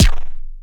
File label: dry kicks; Quasimidi Rave-o-lution 309